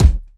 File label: clear kick single hit c sharp key, Royality free bass drum tuned to the C# note; kick drum samples